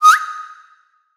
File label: decoy whistle